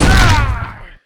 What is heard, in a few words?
Football Hit Tackle